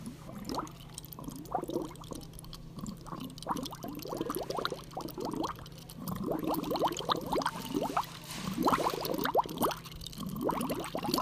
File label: Galvanized Water Main Under Grassy Area Heard With Soil Probe